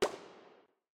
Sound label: quick reel stop; east sea dragon king